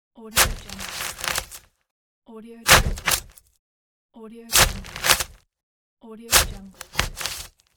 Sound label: knife stab cut